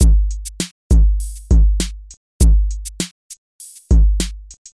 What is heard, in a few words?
Hip Hop Drum Pump